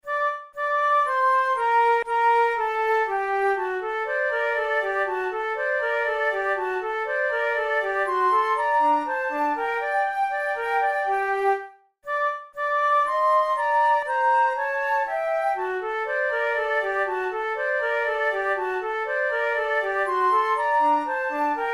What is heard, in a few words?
methode de flute; tulou